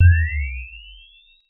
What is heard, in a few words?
pulse